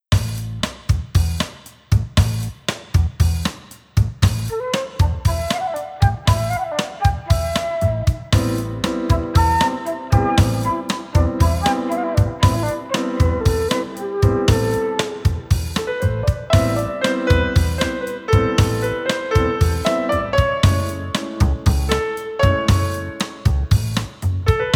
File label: Bossa Flame